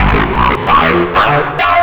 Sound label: guit; Sonic Foundry (Sony Creative Software); Ugly Remnants VOL-2; Knutrix Demo